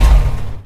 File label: SHUTDOWN